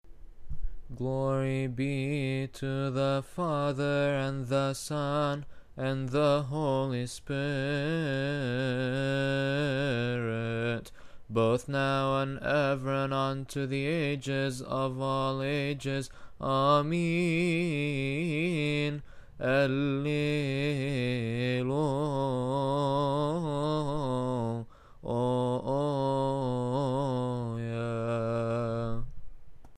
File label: glory english, hymns; annual congregational replies; doxa patri; ϫⲟⲍⲁ ⲡⲁⲧⲣⲓ